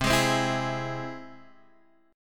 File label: C Augmented, Guitar Drop D, chord